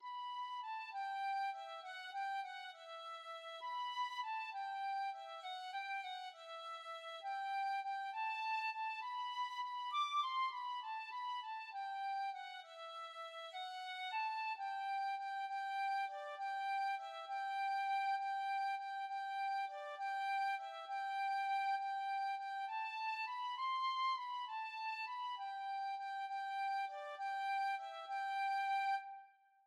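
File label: penny whistle, tin whistle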